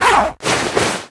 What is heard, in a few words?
Prisoner Trousers Down